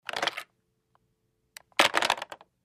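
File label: Telephone Pick Up Hang Up Light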